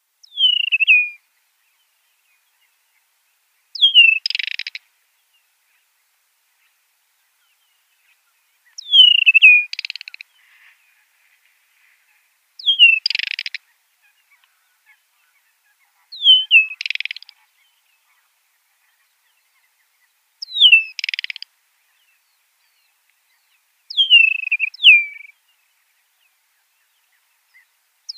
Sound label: Desert Wheatear song